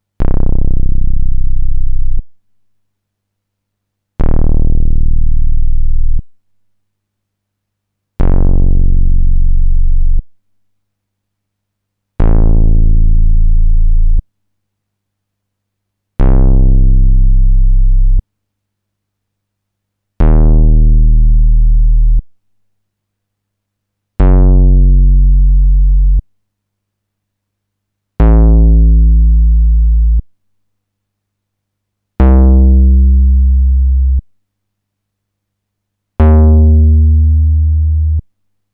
SQ Bass, synthesizer

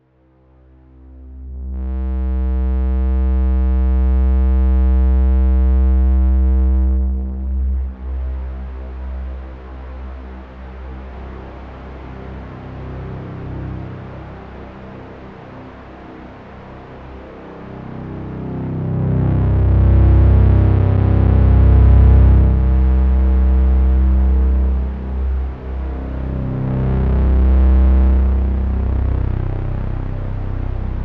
Sequential Pro3 Tuned FeedBack

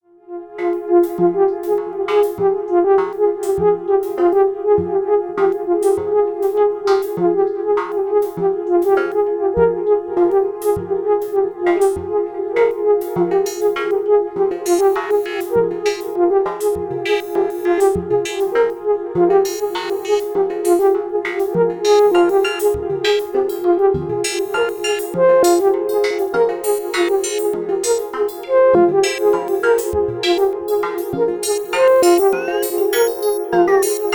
synthex crazy mix

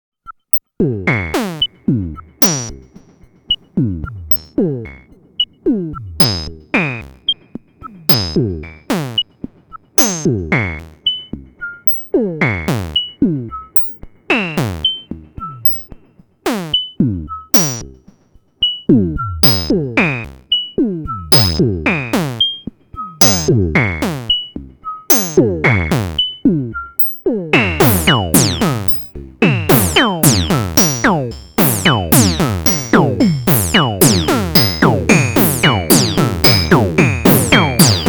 A1 Filter FM

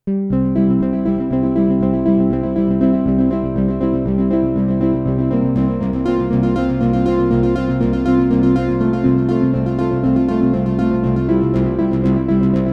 Modal A8 What The Pluck ARP

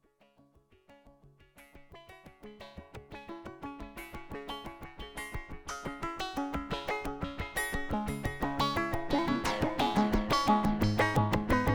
Experimental

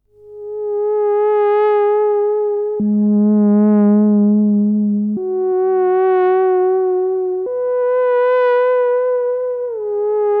S100F Solo

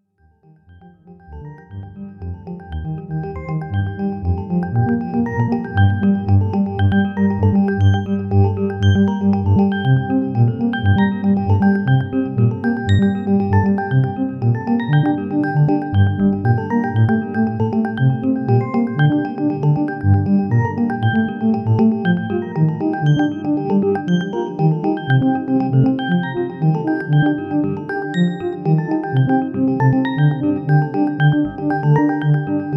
ppg wave mix

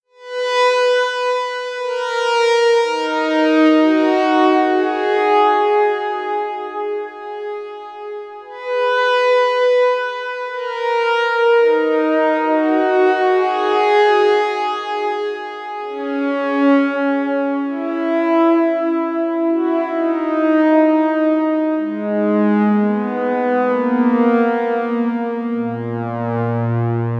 ps3100 Synthex

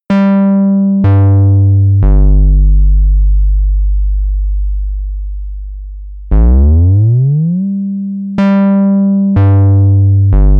S100F Deep Pulse

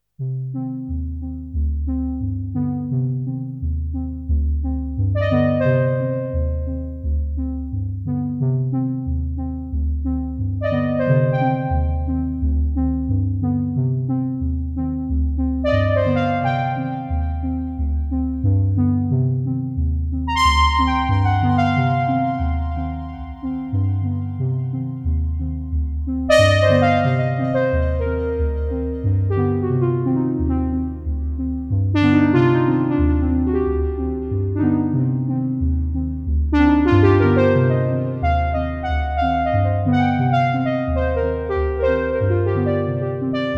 JupiterX JX8P Analog Piano

